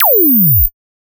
impulse response

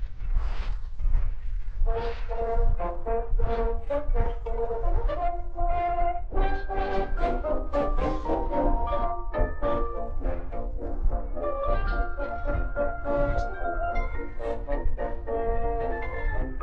Marsch, denoised; seanet

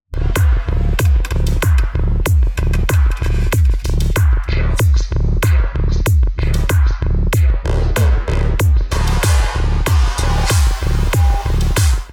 techno

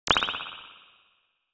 complete modified lower coeff, spring

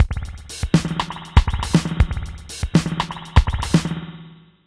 drums processed original

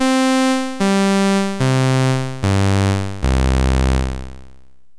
waveslice

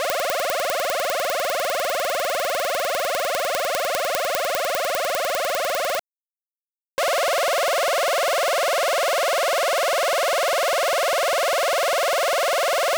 transients

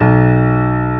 PIANO MEDIUM B1

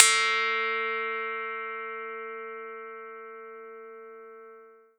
bell wall chime

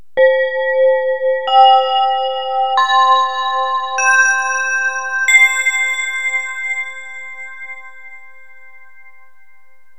bell

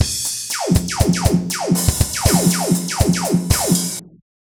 drum snare

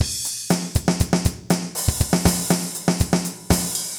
drum mix

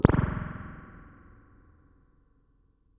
Spring

